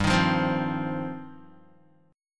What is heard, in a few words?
G 7th Suspended 4th Sharp 5th, Guitar; Listen to G7sus4#5 strummed; G7sus4#5 Chord